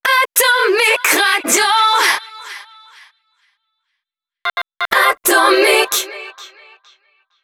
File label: JINGLE acap; ATOMIC; HABILLAGE ACAP